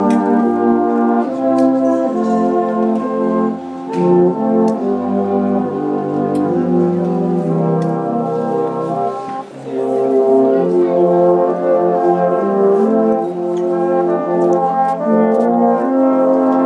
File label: brass band in John Lewis